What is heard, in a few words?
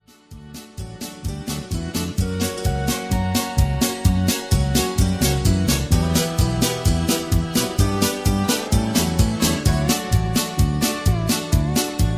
Patter